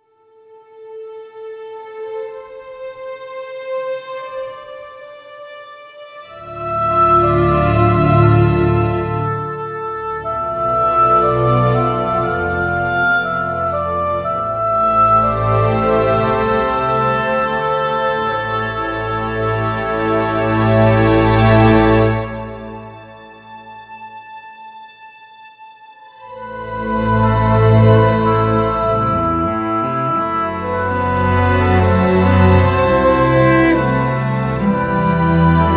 Instrumental; Ekti Tarar Khonje 2010

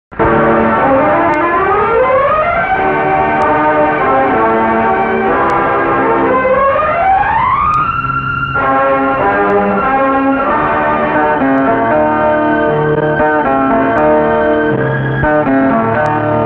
Title Instrumental; Bahurani 1989

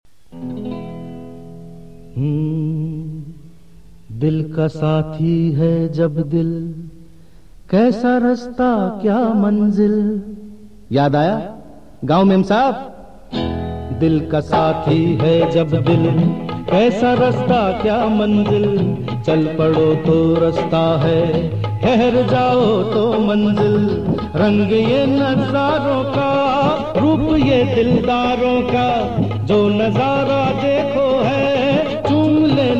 Male; Salaam Memsaab 1979

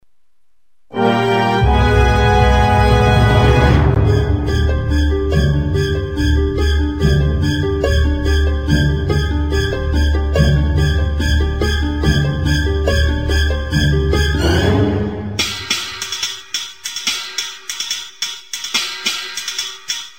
Music; Makdee 2002

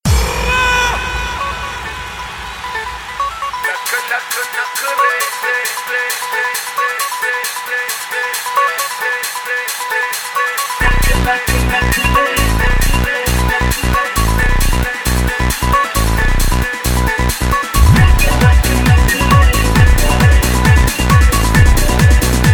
Electro Dhol Mix; Kambakkht Ishq 2009

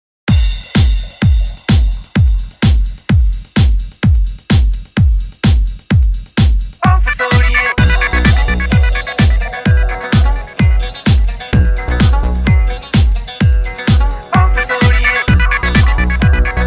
Remix; Bird Idol 2010

